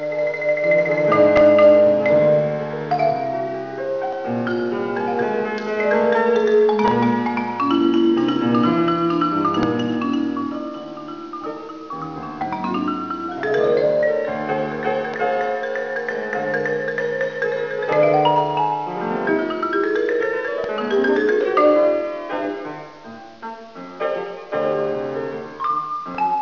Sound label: marimba